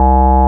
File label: BASS, SYN BS; AKAI S6000 CD-ROM - Volume 1; SAMPLE WAVE MIXING 2; SYN BS #3